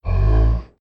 Bear Hmm

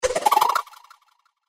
Spin Dodge Moving

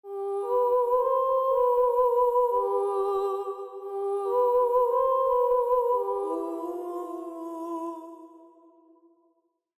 Siren Singing Low

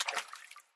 Swim In Water Surface

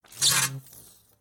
Electric Charge

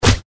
Fish Body Hit

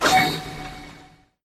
Boom; Naruto

